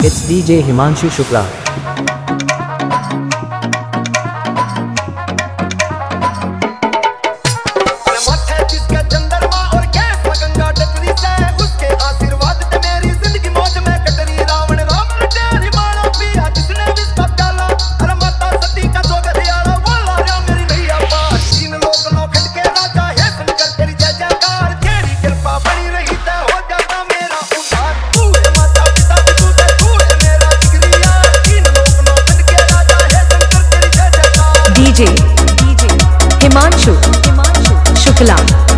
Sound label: Dj Edm Vibration Mix